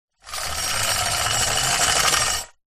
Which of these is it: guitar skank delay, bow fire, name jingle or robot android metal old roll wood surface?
robot android metal old roll wood surface